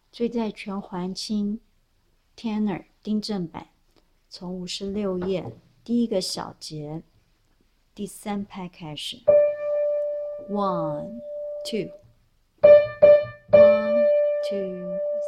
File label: Tenor